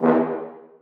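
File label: horns staccato e2; Sonatina Symphonic Orchestra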